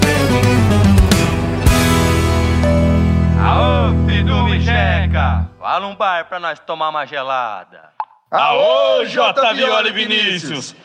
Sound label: sertanejo; balada g4